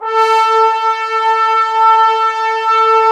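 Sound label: brass trombones A, Woodwind Brass Ensemble; 90 s Sample CDs; Miroslav Vitous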